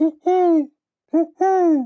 owl emote; paladins strix

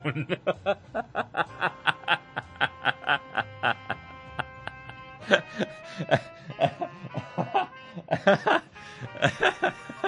laugh; DansGaming

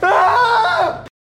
yell; jeffy

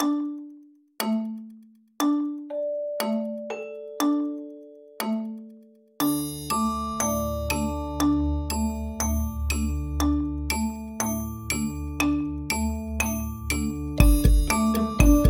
gameshow timer music; GameShow countdown

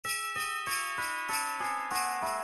christmas sound effects, Bells

